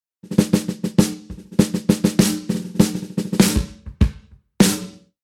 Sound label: Fat